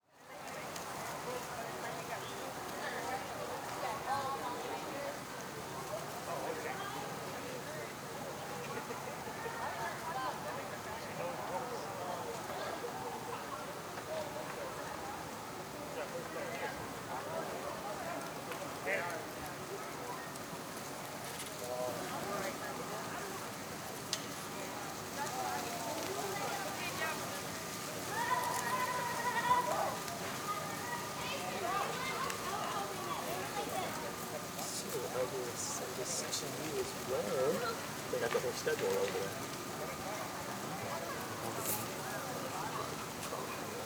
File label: powwow; Menominee PowWow 4 Aug 2023; Menominee Homecoming Powwow August 2023; Bowl Near Top